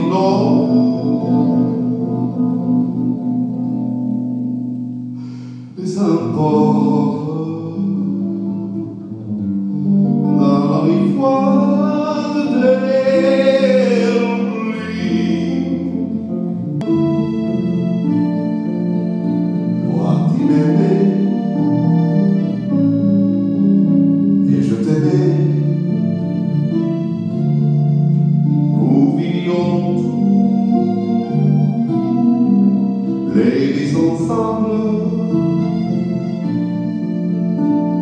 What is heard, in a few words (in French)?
chanson